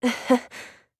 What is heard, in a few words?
hehe